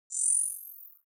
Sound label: ui classic zoom out light